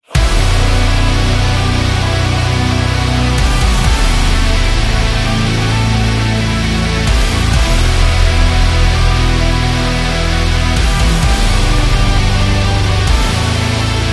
Victory Day, High-energy background music for workouts, action videos